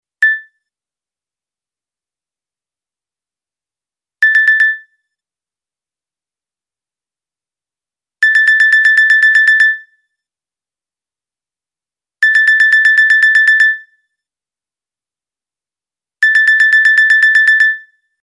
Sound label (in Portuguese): alerta relógio